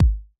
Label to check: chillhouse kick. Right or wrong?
Right